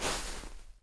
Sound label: Foot Snow; Civ4 warlords